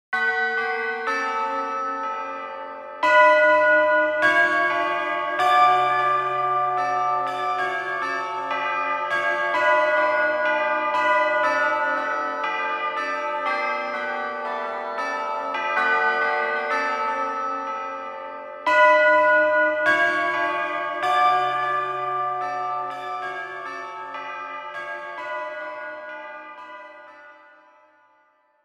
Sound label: carillon